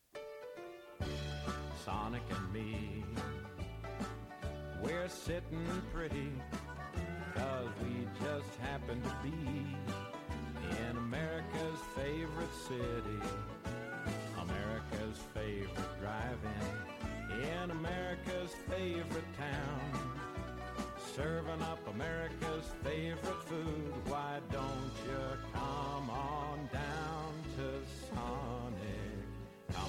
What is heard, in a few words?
SONIC JINGLE